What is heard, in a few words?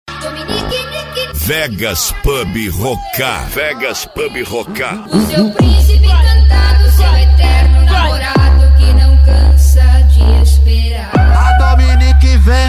funk mega bass